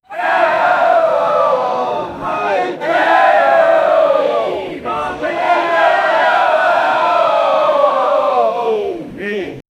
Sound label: large group of people cha, chanting